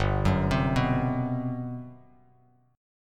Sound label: G Major 11th, Bass Standard; arpeggiated; JGuitar